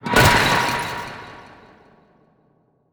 Card Exclusion

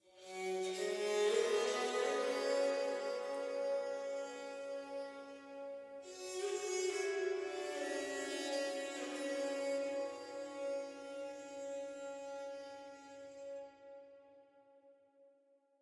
Palace Day Zip Stinger; assassin s creed chronicles india

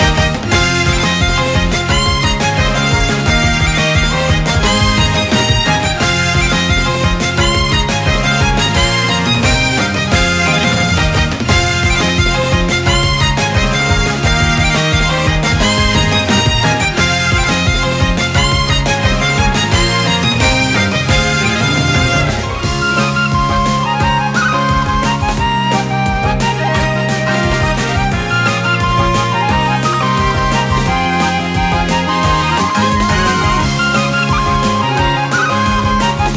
Prairie loop; PerituneMaterial Prairie5

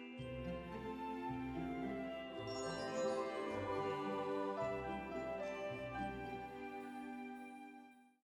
music sting gameover; My Little Pony

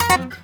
Guitar Missed note; Levels Hub Diner; Light Buford

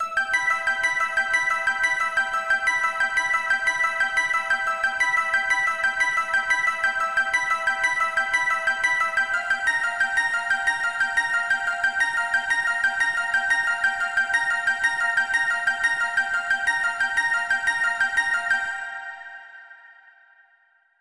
Pluck Melody; super crush k.o.

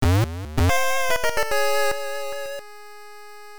Failure Jingle; Pop'n Music GB Animation Melody

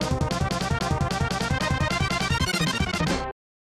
PK Announcement; J League Excite Stage 95; SNES

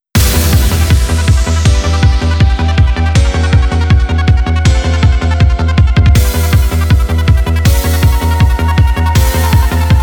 instrumental; Super Forest Beat vol9 2019

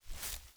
foliage jump; teardown; ps5 windows xbox series xs

